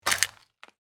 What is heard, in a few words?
Egg breaking crack cooking